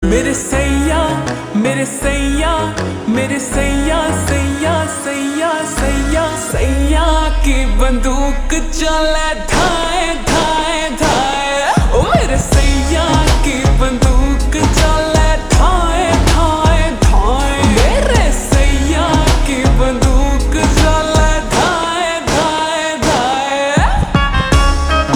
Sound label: Ringtone, slowed and reverb